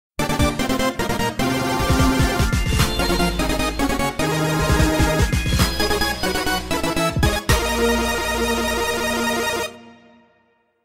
Synthesizer Obtained; Cadence of Hyrule

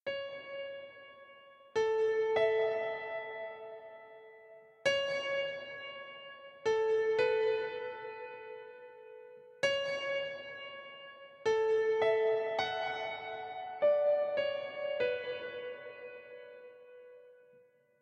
Fill; nova 111; ps vita

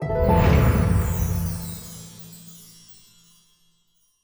ui goal done; Shadowhand; macos windows gamerip 2017

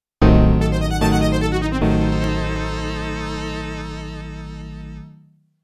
Heavy Shock; FM-TOWNS

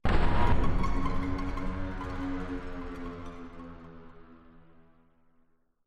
Music Stingers Stinger

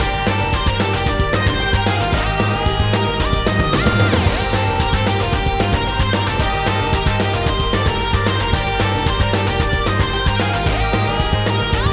Escape Loop; Necrolepsy

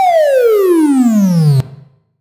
SFX Fall

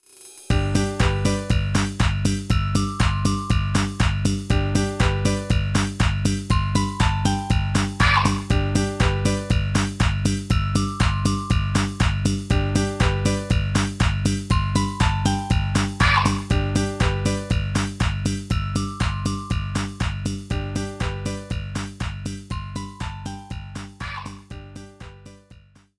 BGM TOP; Kirakira Rhythm Collection; Nintendo DS

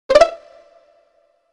Activate Cheat; Banjo-Tooie

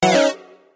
Battle; Kairobotica; android ios mobile ps4 switch windows gamerip 2012

